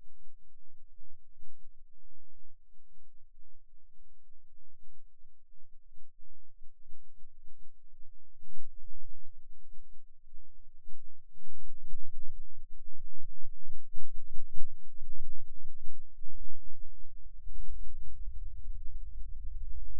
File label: Rhythm Seizure, eeg